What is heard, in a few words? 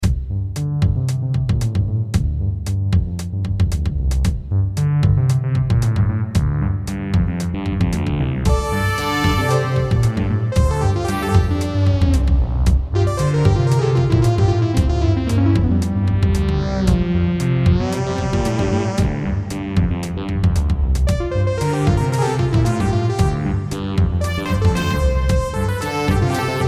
Groove